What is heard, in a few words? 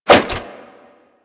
Nunchaku End Whoosh; Warframe; Tenno